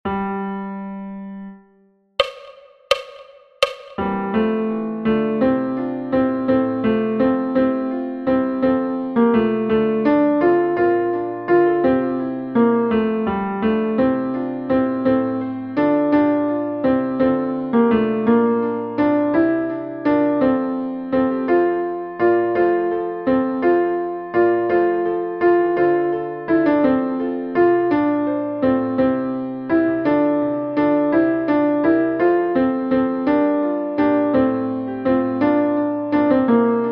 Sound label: tenor; Ye Banks and Braes